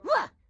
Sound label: grandma short yell; Blind Drive